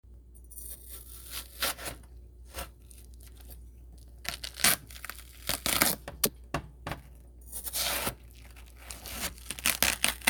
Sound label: ASMR